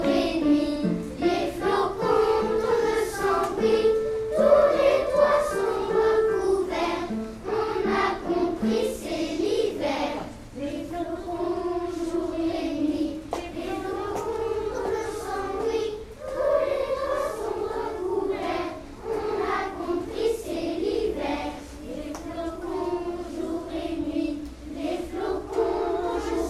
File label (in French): chant de noel